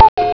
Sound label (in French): Coucou